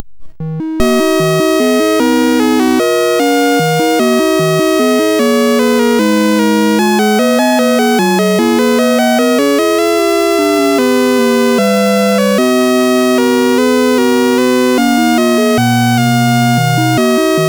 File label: sid MOS6581R4; Lunattack